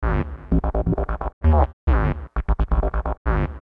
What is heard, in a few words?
atom split bass